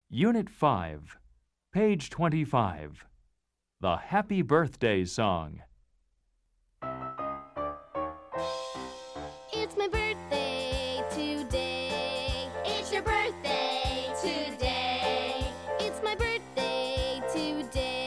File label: Happy Birthday Song Group; logos english; Let's Chant, Let's Sing 1